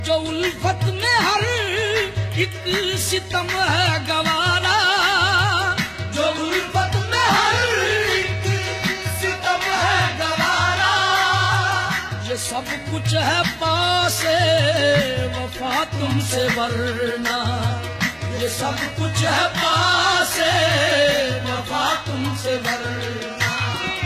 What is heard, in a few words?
Qawwali